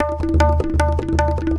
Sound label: Tabla